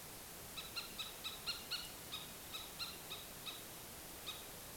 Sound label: alarm